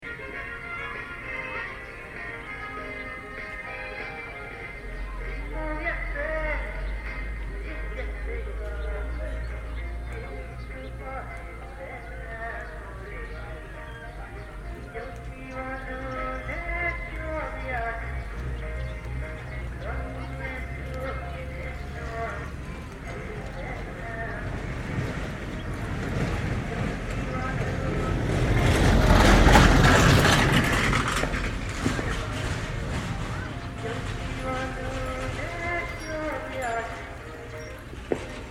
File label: pitstop